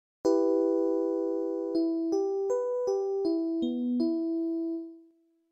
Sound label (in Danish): skala e m